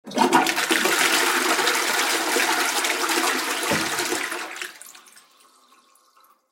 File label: Bathroom Toilet Flush